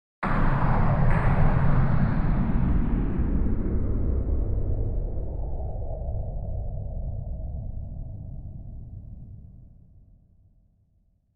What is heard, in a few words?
Space Launch, Sound of launching weapon or craft into space.; Battle